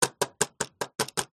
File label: leisure toy plastic figure walk movements